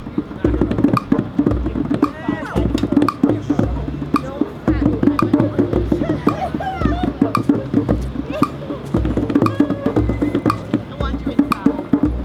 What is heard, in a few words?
tamtam